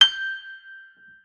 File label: Piano G high and high and higher